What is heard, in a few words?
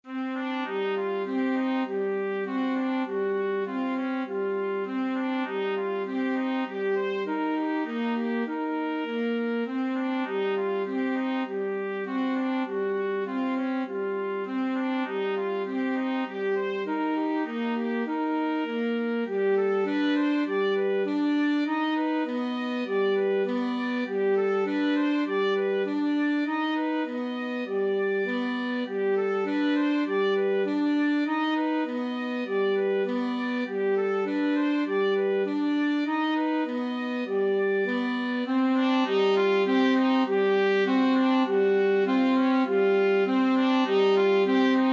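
trumpet alto sax; trumpet saxophone duet; greig mountain king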